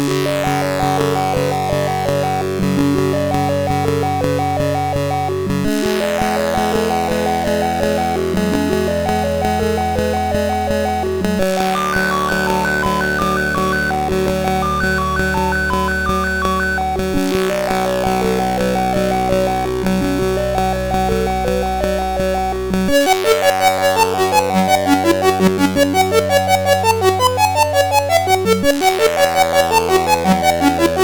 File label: Mini Melodies, SID CSG8580R5